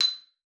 XPLA B6, XYLOPHONE; Xsample VOL-4

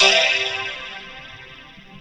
VOCODER DRUM

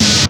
FIRE SNR, Drums; Distored Reality 1&2

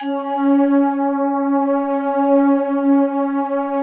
PAD C4; Best Service Gigapack 1

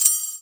SHAKER, INDIAN PERC

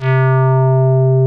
BASSVOX; Masterbits; Vintage Voltage; SQ+FX

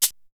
Shaker, Drums; ZERO G - Datafile DISC 1

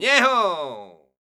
flamenco vocals, male

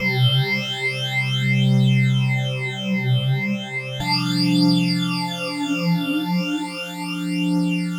Spiral String; Sonic Foundry (Sony Creative Software); The Electronic Music Manuscript Collection

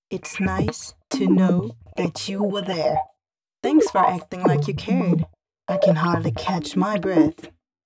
female c# 132bpm, Dance Ultra Voice FX; Mutekki Media

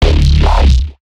Growl Bass; neuro; BIG5AUDIO

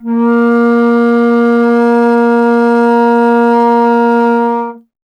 Alto Flute; Miroslav Vitous - Solo Instruments VOL-2

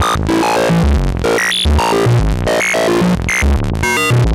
Random, LFO & Noise

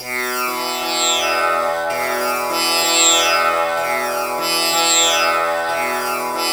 TAMPURA; Spectrasonics; Heart of Asia

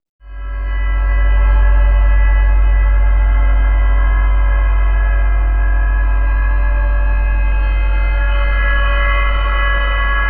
Slow Bell Drone

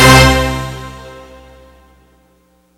blasts, hits; Kid Nepro - Elite

